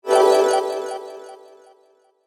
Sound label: Magical Whoosh, Production, Element, Magical, Whoosh, Delay